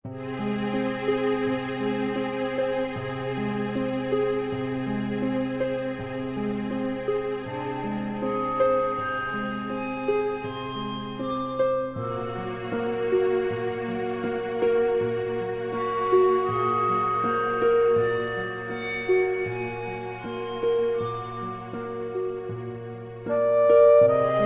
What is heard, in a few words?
Water Prelude Intro